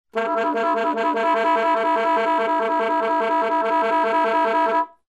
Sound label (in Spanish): Tremolo multif